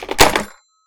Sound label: Rotary Telephone Receiver Put Down